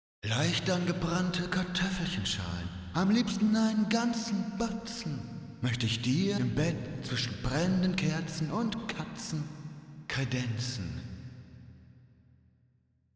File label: Squish Steel; Kush Goldplate; Op Jott